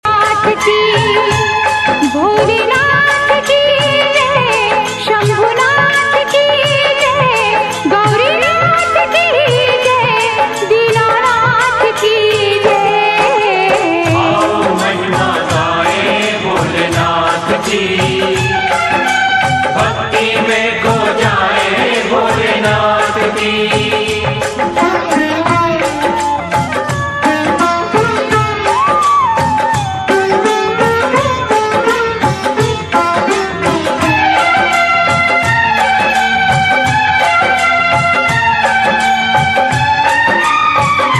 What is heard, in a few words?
Female Version